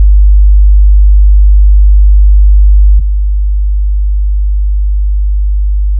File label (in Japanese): 50Hzの音データ/基準音と低減音 [自動車の低音マフラーなど]; ペアガラス対応